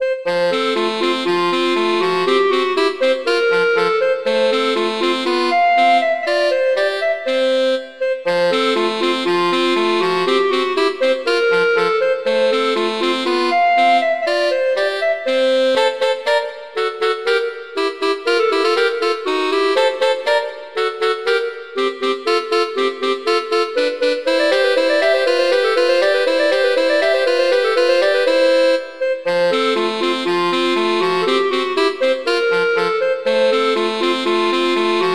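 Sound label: Duet, horn; Mozart; Duets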